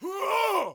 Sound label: Jarl Attack Vocals